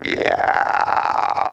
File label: TB THROAT, Talk Box